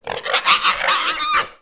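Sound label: gamorrean attack; Star Wars Knights Of The Old Republic I; XBOX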